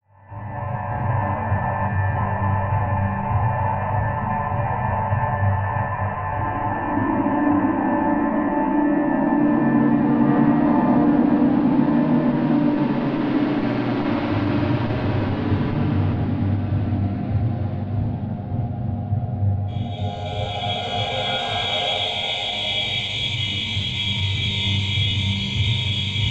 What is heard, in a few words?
Echo Pulse Distortion; Module Production Element Title Imaging; Imaging Elements